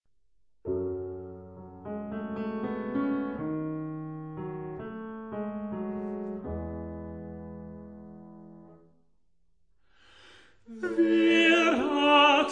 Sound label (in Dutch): Wer hat dich so geschlagen tenor zang